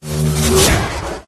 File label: whoosh; Audio Mangler